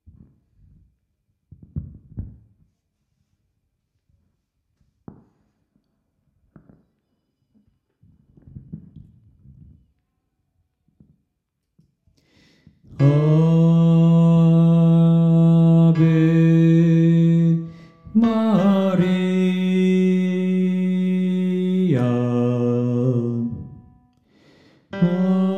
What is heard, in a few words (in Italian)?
ave maria caccini uomini